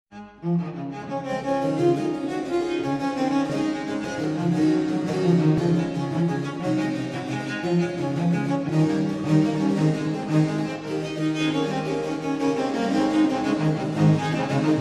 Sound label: pipa